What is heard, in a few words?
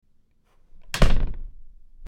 Door closing